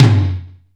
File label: heavy t, Yamaha RX-5